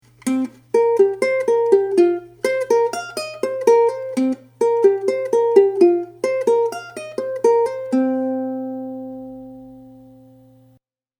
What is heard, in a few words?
groove, ukulele